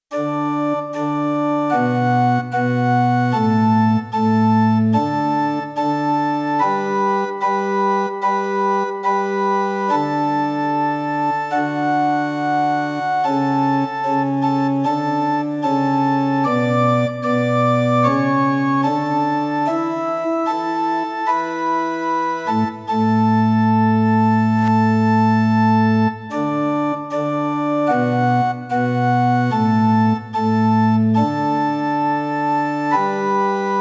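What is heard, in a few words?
Pitch 440 Temperament MeanTone, organ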